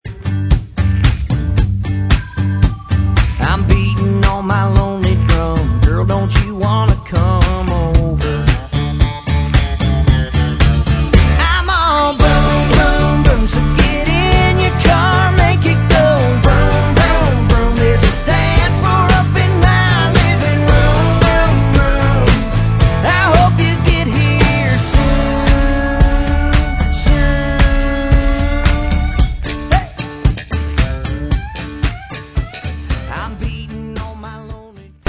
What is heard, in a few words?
Lonely Drum